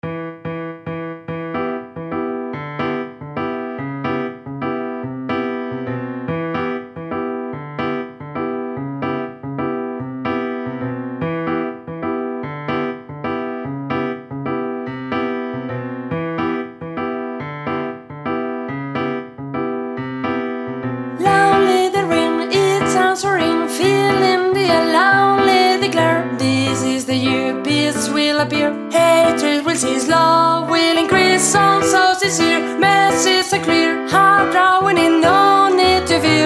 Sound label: ALTO, SHOW CHOIR; CAROL OF THE BELLS; ADIOSES SHOW CHOIR; Navidad 24